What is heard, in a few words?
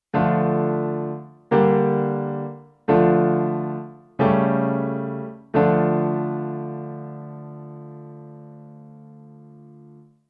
d minor i iv i V7 i